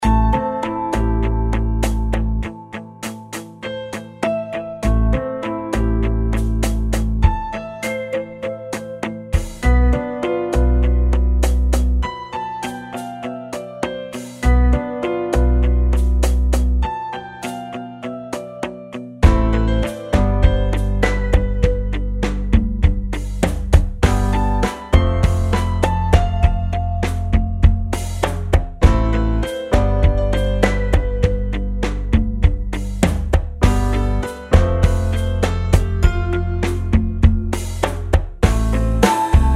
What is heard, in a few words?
instrumental